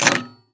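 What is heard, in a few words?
Bumper, pinball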